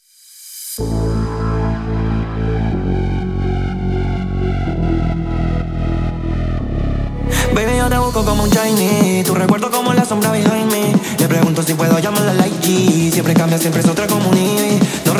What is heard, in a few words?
intro outro 124 bpm